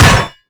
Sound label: arm punch generic